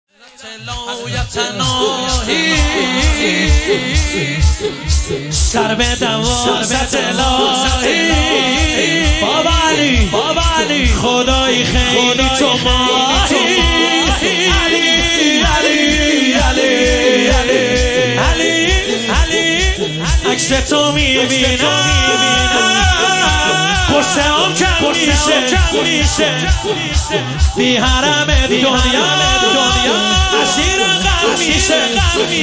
مداحی

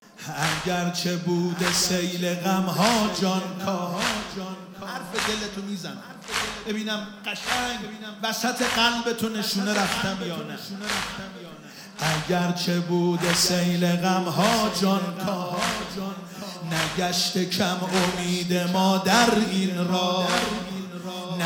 مداحی

